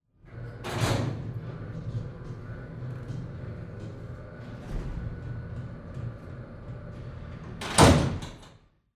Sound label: Emergency Security Door Close Sliding